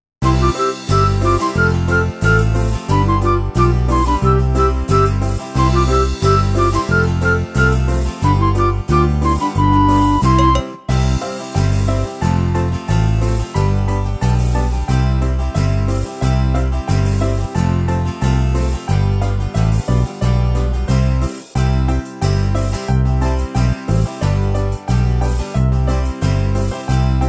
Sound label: Karaoke